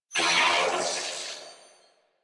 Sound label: Death Grineer Male; New War; Narmer